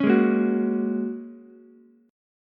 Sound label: Ab 7th Suspended 2nd Suspended 4th, Ukulele; Ab7sus2sus4 strummed